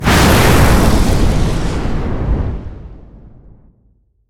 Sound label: fire activate; smite; god merlin